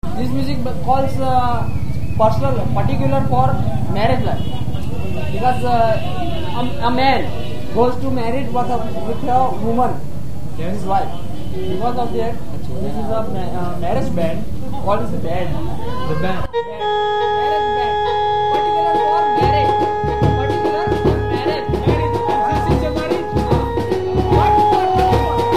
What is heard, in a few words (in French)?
mariage gujarati; baroda